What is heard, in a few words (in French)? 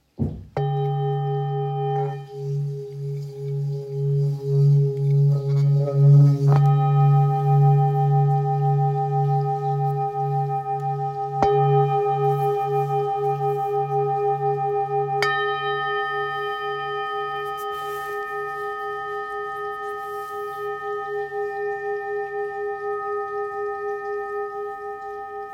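Thérapeute sol dièse 1,210kg, diapasons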